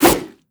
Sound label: attack whoosh, antlion; creatures